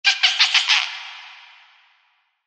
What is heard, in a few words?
evil laughter real